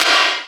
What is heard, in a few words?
Slid, Hit; Sonic Foundry (Sony Creative Software) - Future Retro Dance Excursions